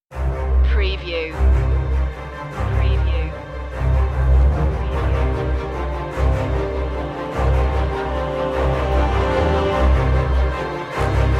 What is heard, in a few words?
angels demons no perc